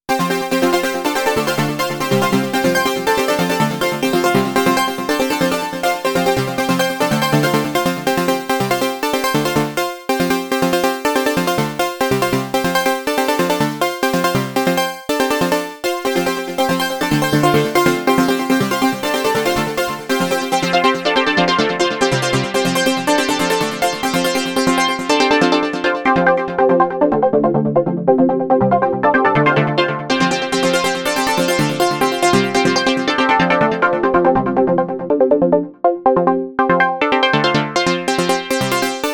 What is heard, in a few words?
Chorus Arpeggio; Sonicprojects OP X PRO 3